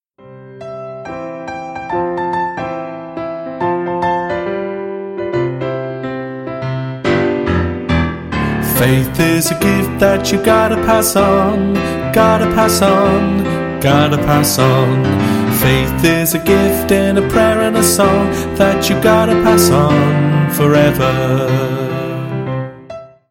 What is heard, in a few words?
Vocal